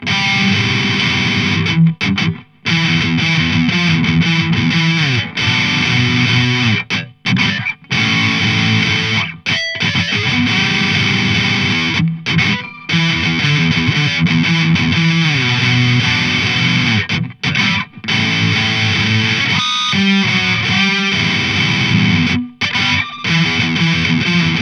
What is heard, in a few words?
Boss ME-90 Recti Stack